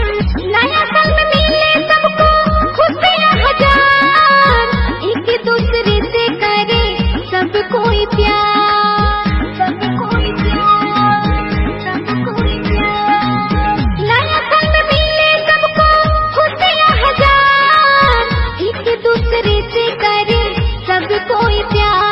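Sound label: New Year Ringtone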